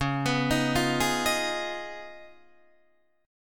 Db 9th, Guitar, Open D

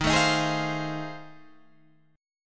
F 7th Sharp 9th

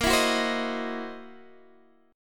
C 7th Sharp 9th Flat 5th

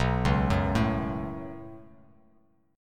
B 7th Suspended 2nd, Bass Standard; B7sus2; arpeggiated; JGuitar

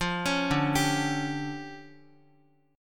D Minor Major 11th, down, Guitar; DmM11 arpeggiated; JGuitar

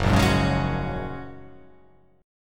Gb Major Add 9th, Gbadd9 chord; strummed; JGuitar

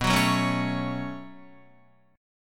B 7th Suspended 2nd, down, Guitar; B7sus2; strummed; JGuitar

